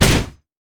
steam golem basic attack; LoL; blitzcrank

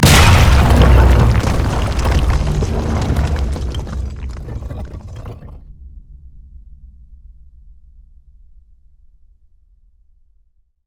turret break; chaos turret

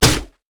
pirate basic attack; LoL; gangplank

